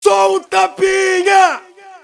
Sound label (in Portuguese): tapinha